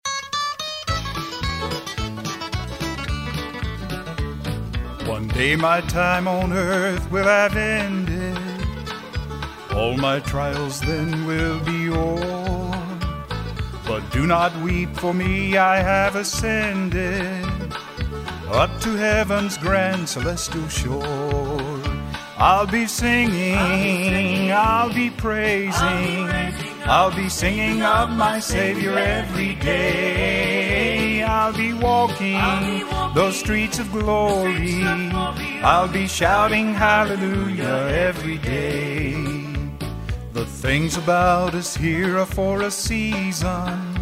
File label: i'll be singing